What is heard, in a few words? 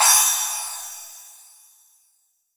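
SPLASH, Drum Machines; EdgeSounds; Drum Mashines VOL-1; X-DRUMS KIT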